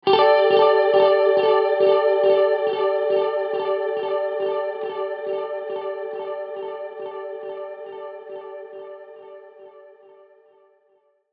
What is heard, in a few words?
guitar verse intro